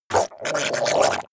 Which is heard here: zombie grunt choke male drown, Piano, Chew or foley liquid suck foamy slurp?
zombie grunt choke male drown